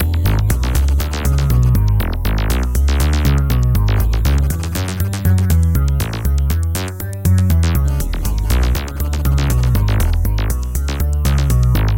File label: bg sound